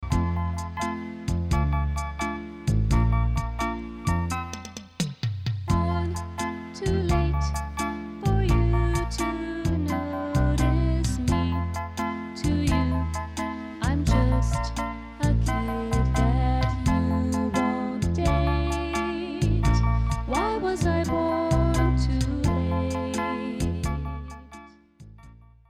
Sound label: 50s & 60s